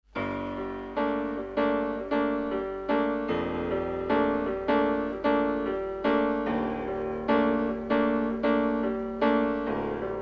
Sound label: rhythm & Strings